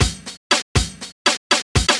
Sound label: drum loops; bb drum loops